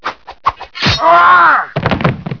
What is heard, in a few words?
sword